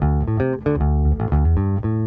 Disco Bass, 70s Analog Funk; Sonic Foundry (Sony Creative Software); What it Is! 70s Analog Funk

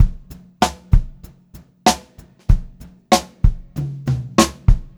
Pop-Rock Drumloops; 96 POP 2

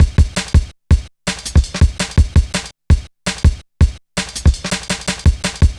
Cymbal Rise, Drum Loops 165.5; Zero-G Jungle Warfare 1, 2 & 3

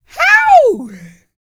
YELL, MALE HUMAN; Spectrasonics Vocal Planet

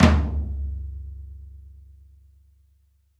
Folk Flam Tom

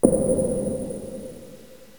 Snare, Korg Z1

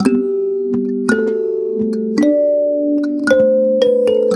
Kalimba, African; Sonic Foundry (Sony Creative Software); Continental Drift Aftershocks

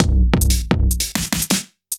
Kit 2 Heavy Swing 120; off the grid

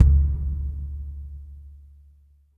WD R, DRM HITS; Spectrasonics; Liquid Grooves

